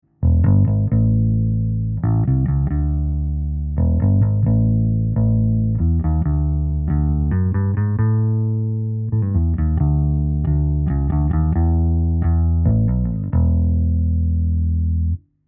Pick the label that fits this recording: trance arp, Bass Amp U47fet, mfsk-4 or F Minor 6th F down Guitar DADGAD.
Bass Amp U47fet